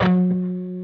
PRS G, Guitars, LEAD

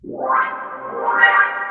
ORCHFX, orchestra, Asian Traditions

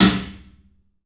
CLUB SNARE; 3D Sound; 3D Drums

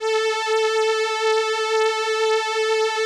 VP-330, String Machines

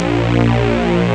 Heavy Synth, Amiga Soundtracker; Amiga Soundtracker Sample Packs

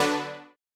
Housey Stab D3; KG MegaSaur

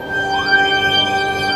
SHOWER; NorthStar - The Wizard VOL-2; RAINMAN

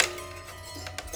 Prepared Piano; Battery 3 Sample Kits

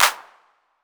808 CLP; Wizoo; Platinum 24 Electronic; PERC TR

